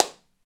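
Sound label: Flamenco Hand Clap; Roland BK-5; Claps & Snaps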